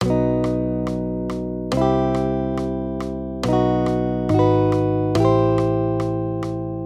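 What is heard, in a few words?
Accompaniment, Major Triads, B-C, 140 BPM, guitar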